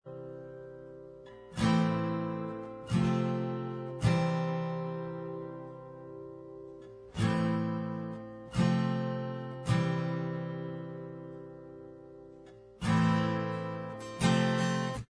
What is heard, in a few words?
acoustic Inst